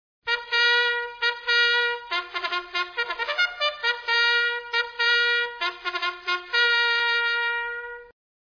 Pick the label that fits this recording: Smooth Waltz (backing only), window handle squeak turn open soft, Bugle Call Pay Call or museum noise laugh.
Bugle Call Pay Call